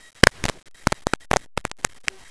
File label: fart